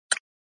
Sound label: sound water bt click; watermargin